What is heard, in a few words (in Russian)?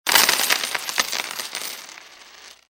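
монеты высыпали на стол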